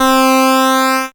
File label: syn, Dance Synths, Acid Bubble; ProSamples vol.37; Best Service